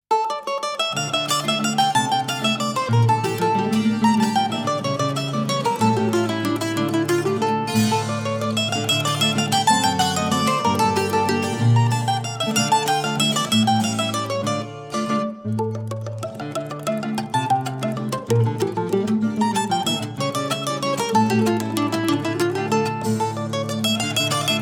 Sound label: Music Vehicle Radio Events